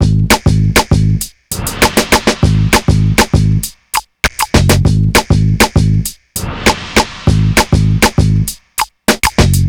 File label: E-Coast HipHop Combo Abmin; Digidesign; Pro Tools Essential Loops